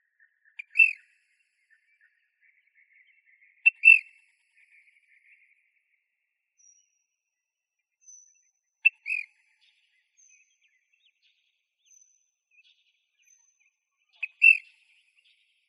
Flycatchers; AZFO; deviche

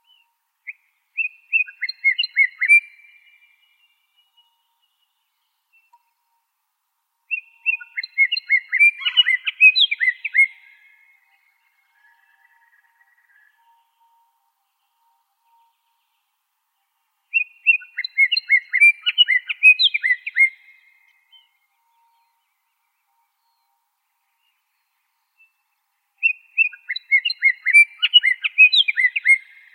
SCOR, Icterids; AZFO; deviche

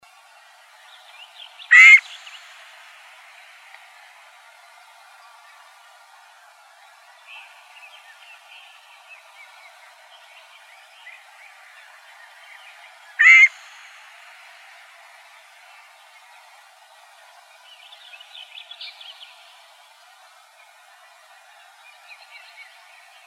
scaled quail; Upland Gamebirds

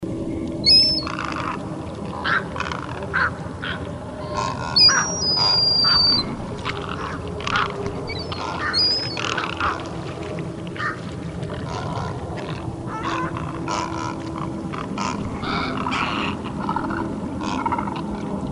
Large Wading Birds; GREG

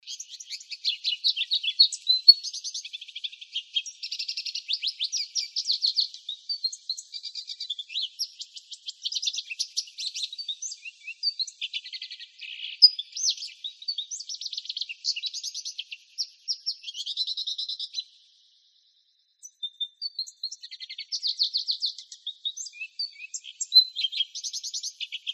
LAGO, Finches; AZFO; deviche

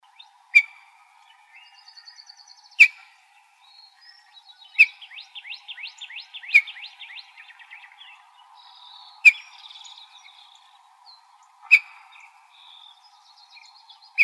calls, Icterids; BUOR; deviche

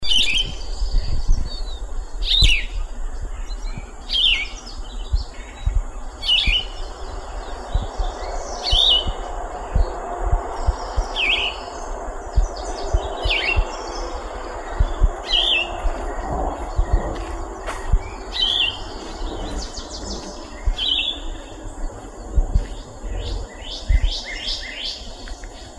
Vireos; YTVI

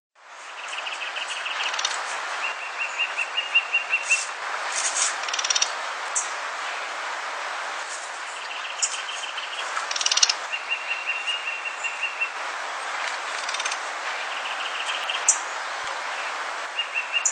Sinaloa Wren calls; RThompson

